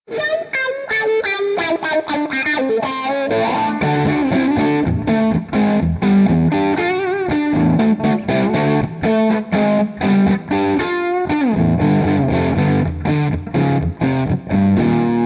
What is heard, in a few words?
JTM45100 Vintage Bypass Script Wah; Chase Tone